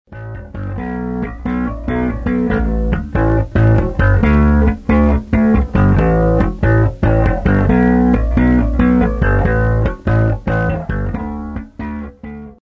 wine jazz, bass

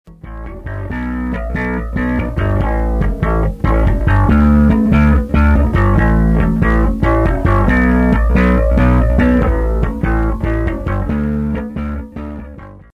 ash p bass, bass

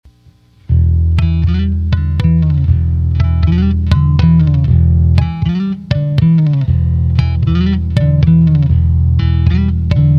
vt bass

